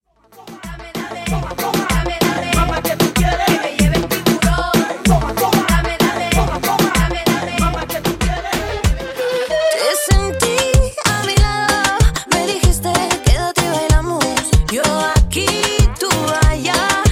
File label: Party Latin Reggaeton Megamix Urbano, 95Bpm